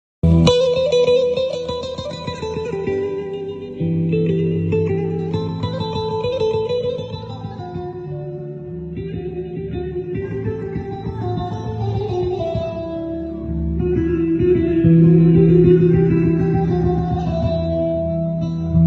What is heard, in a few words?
baglama; ساز باغلاما